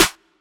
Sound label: big room steel snare drum E key